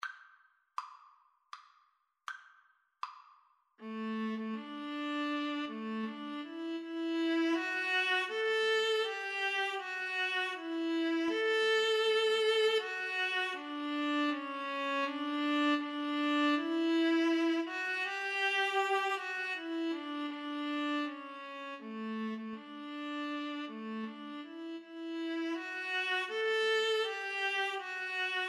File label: nocturne, Viola Cello Duet